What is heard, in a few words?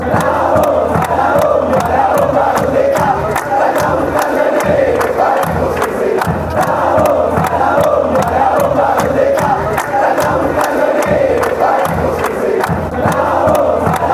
bomb, chants